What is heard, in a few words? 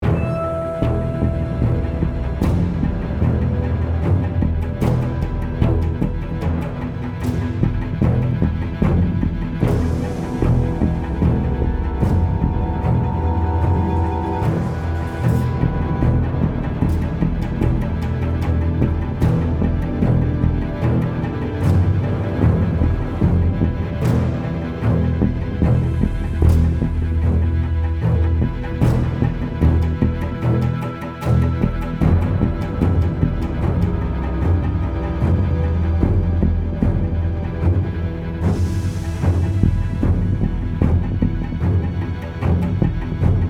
Stealth loop